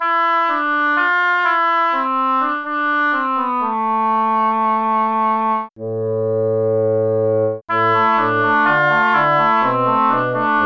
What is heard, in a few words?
oboe samples